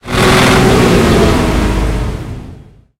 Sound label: panzer3 engine load, tanks; engines; War Thunder